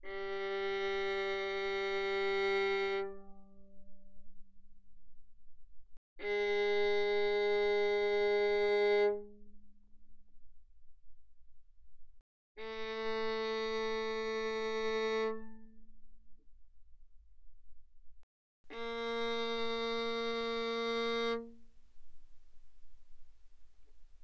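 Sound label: Violin arco mezzo forte sul G G3 B3; Strings; violin2012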